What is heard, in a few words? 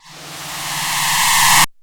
stopp